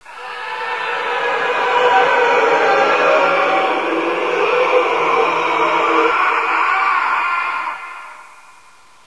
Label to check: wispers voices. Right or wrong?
Right